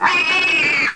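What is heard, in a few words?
SCREECH; AB3DII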